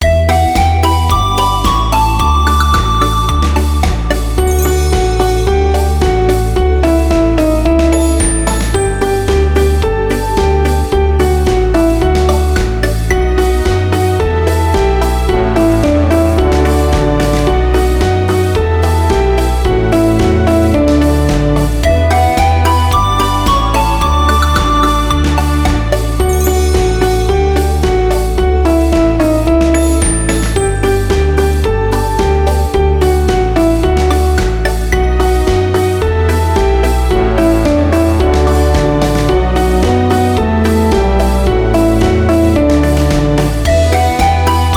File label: karaoke